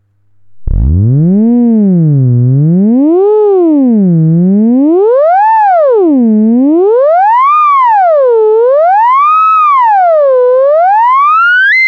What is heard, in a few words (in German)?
sinus verzerrt